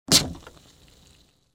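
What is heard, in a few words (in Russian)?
звучное открывание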